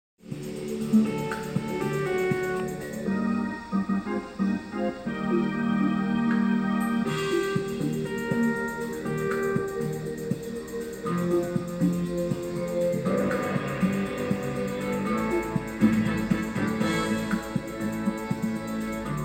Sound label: karaoke